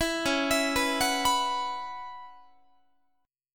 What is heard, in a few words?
C sharp Minor 11th, Guitar; arpeggiated; C#m11; 19,21,19,21,22,22